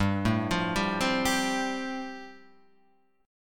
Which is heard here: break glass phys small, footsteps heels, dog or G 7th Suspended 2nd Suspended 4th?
G 7th Suspended 2nd Suspended 4th